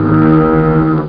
GOAL

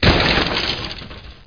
break wood; heretic ii demo

